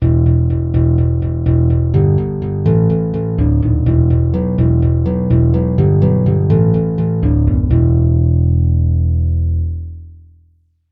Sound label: nylon string guitar bass